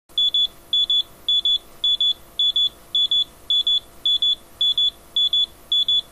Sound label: alarm; minutnik